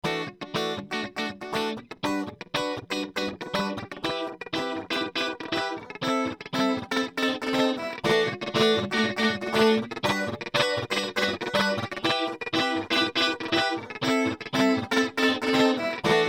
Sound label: Chorus Flanger Guitar